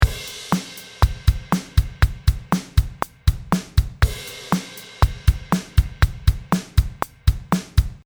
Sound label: 120, batteur